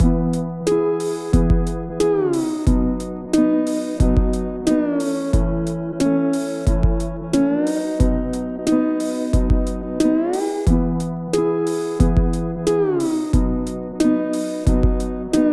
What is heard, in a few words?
Blues